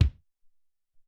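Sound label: Room Kick 4, Alesis SR-18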